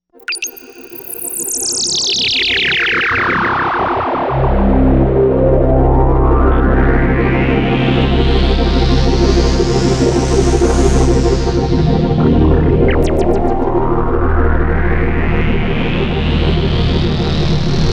Dave Smith Prophet

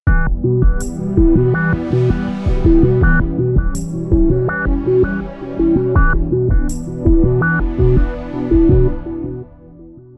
Yamaha XF8 V2

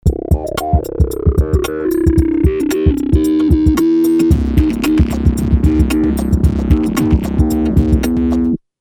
Roland V Synth XT

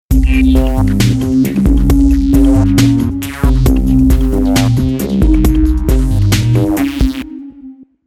Roland Integra 7

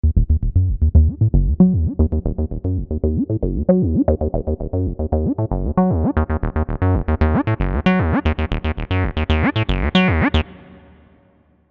Roland TB 3

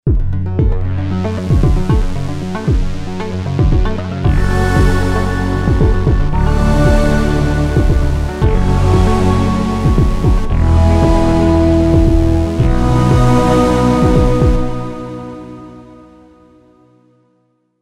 Roland JP8080

